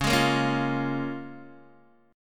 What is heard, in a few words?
C sharp 6th C sharp down Guitar DADGAD